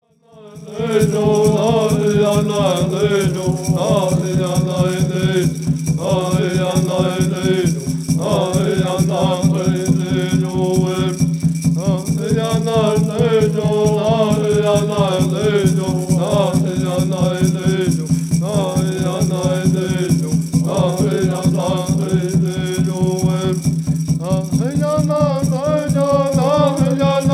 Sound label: Peyote Healing Song